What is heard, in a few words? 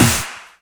Snares; Vengeance - Essential Clubsounds Vol.4